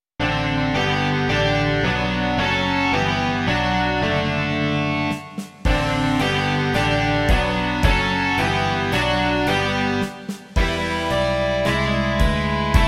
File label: rock